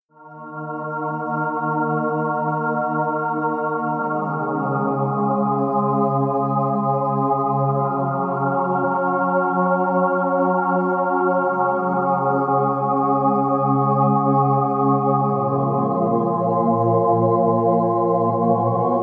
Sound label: irish sad, 432 Hz